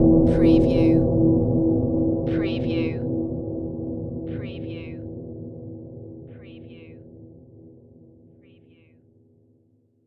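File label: Production Element Hit Bell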